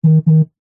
household cellphone vibrate wood surface two blips fast; zapsplat